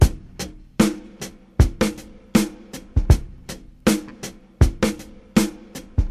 78 bpm drum loop g sharp key, Free breakbeat - kick tuned to the G# note. Loudest frequency: 1006Hz